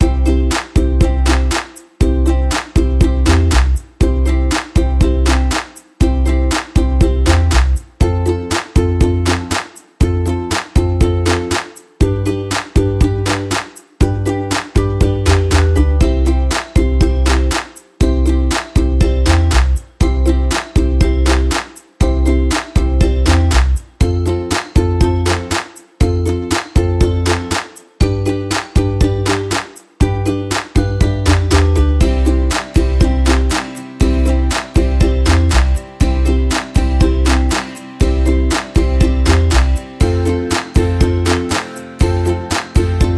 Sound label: Instrumental Happy Musics